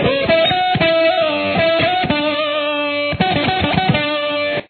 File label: solo